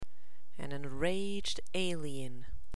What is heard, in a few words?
poster enraged alien